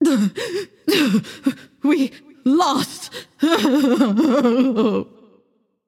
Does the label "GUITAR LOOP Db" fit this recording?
No